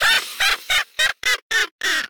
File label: Ghost Laugh; Princess & Conquest v0.20.06